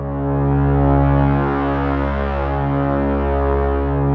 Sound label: Prophet 5, D3; Hollywood Edge - Giorgio Moroder Rare Synthesizer Collection